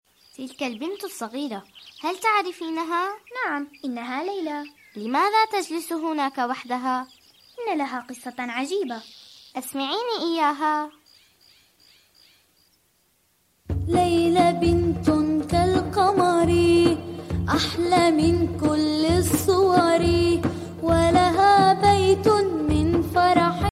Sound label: ramadan tone ringtone